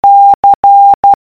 C morse code

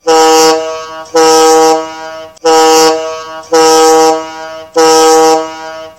five short and rapid blasts

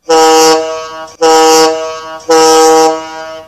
three short blasts

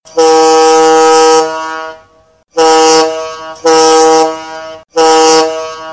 fog one prolonged followed by three short blasts

